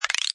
Cartoon Clicks Pitch Up, Two rapid clicks, slaps, clackety wood impa; Comedy/Cartoon CLICKS: Two rapid clicks, slaps, clackety wood impacts; Funny Sounds; Lynne Music